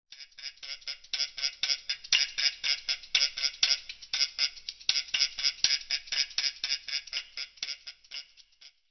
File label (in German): Klein-Percussion